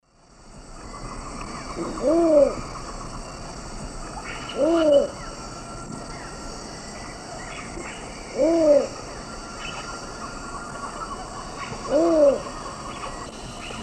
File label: Ixobrychus exilis, call; S. America; Eastern Lowlands